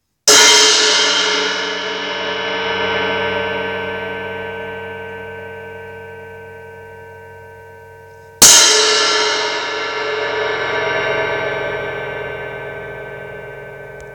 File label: Z Custom Rock 18